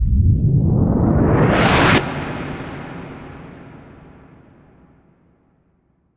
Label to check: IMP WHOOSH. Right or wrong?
Right